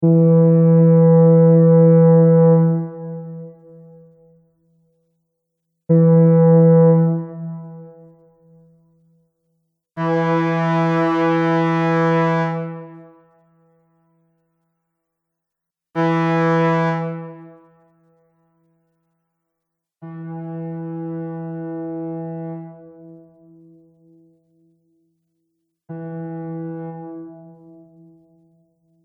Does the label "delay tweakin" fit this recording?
No